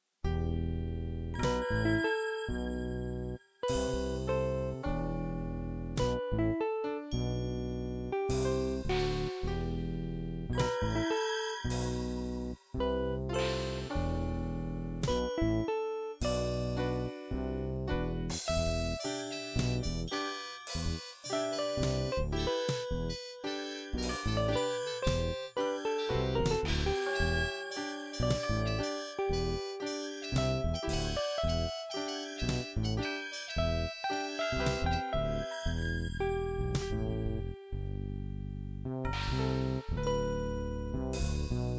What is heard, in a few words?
Blues wonky bells